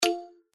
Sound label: Pick; Bonus Match For Cash; Outdoor America